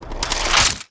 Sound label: droideka unfold